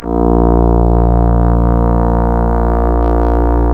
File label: Synclav FM STRINGS A#; Big Fish Audio